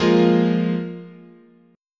F Minor Double Flat 5th, chord

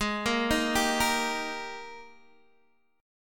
G sharp Major 7th Suspended 2nd, Guitar, DADGAD

